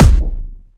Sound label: boom; kaboom